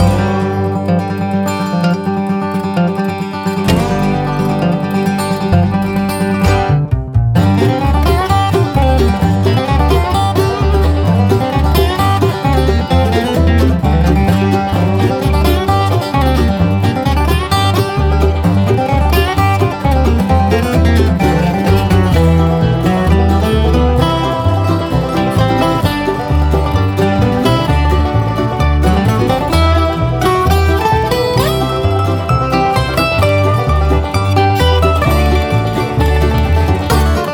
Country Loop; Meow Motors

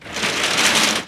door; Shin Megami Tensei IV Apocalypse; 3DS

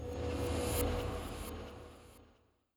transition

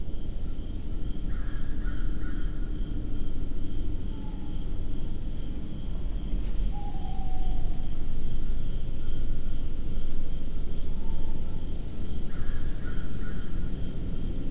Ambient

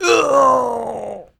Dwarf Die; The Battle for Wesnoth

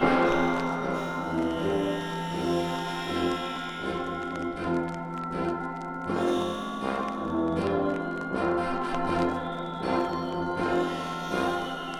Newsreel Slowmo Loop 80bpm; jenny leclue detectiv

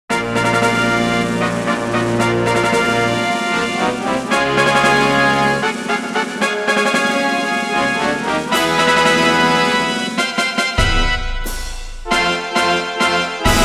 Grade Race Fanfare; Kyoto - Hanshin

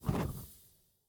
lamp on

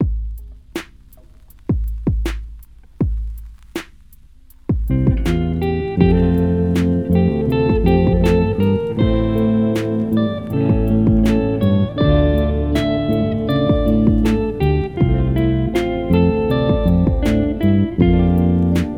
Chill Guitar Remix; Littleroot; RSE

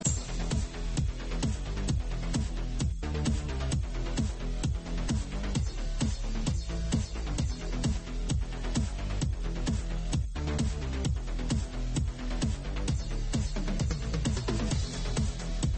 Ninja Groove; Save the Ninja Clan; PS Vita

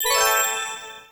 card Match; SpongeBob SolitairePants

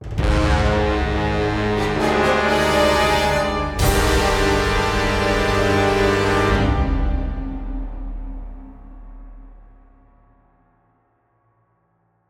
Game Over Stinger

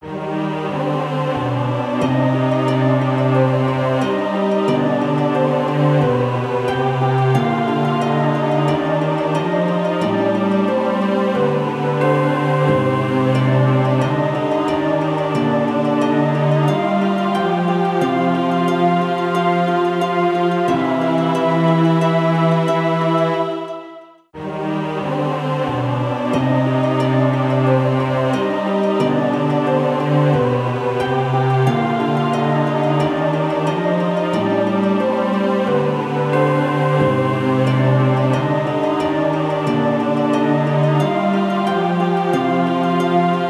Gameover Loop; Monokage Quest; 3DS

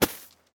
tools snowball; teardown ps5 windows xbox series xs gamerip 2022

